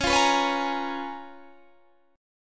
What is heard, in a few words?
C sharp 13th C sharp, Guitar, Standard